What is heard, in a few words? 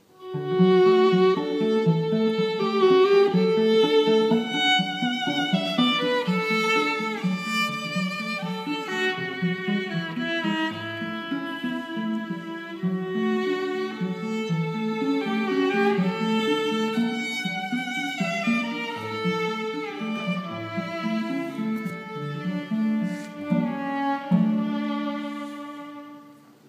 valse